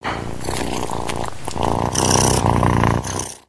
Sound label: Kitten Clean; creatures organic